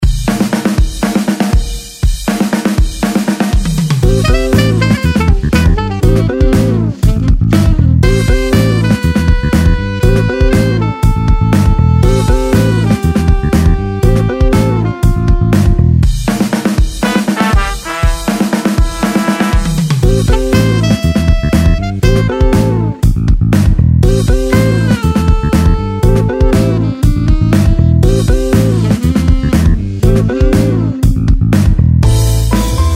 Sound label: JAZZ